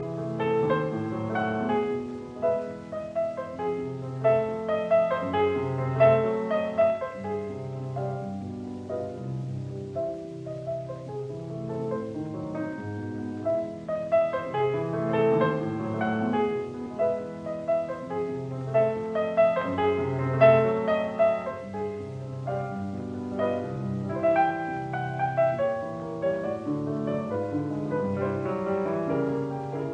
Piano Pieces Intermezzo in A minor Capriccio in C major; 8 Piano Pieces Op 76 No 7; Wilhelm Backhaus